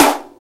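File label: SD, snare drum; X-Static Goldmine 1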